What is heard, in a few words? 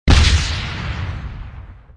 shield; NanoFXv2; ExplosionFX; ExpShield